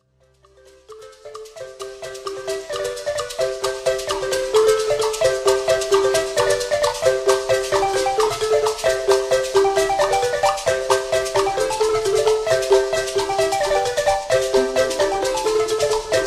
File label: Marimba